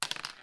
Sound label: dice roll; Dice World